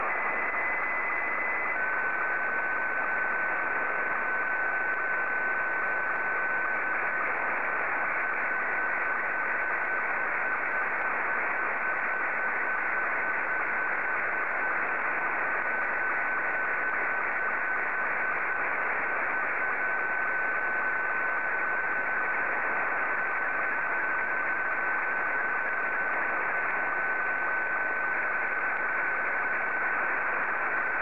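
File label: Scottie 2, slow-scan television; WK-ZGK; SSTV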